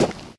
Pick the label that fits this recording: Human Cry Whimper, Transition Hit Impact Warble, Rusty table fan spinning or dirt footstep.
dirt footstep